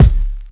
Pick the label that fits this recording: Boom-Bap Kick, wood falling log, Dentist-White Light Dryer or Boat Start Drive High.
Boom-Bap Kick